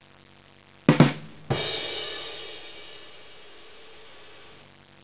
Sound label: drum set; Broderbund Print Shop Multimedia Organizer Deluxe Edition